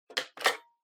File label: Handheld Transceiver, Charging Stand Remove